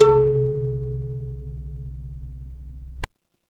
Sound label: instrument kalimba